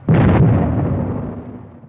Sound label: Bomb Lo; Crackanoid